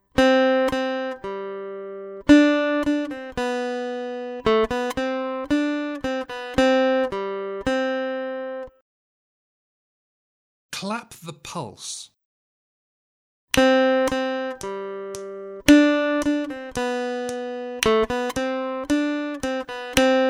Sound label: Aural Rhythm Test, acoustic guitar; Grade 1